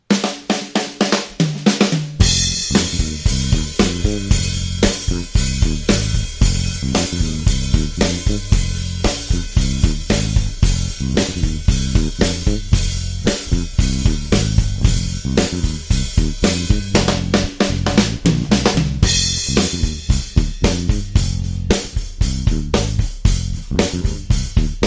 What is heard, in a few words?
Drum & Bass